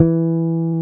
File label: Basses, Roland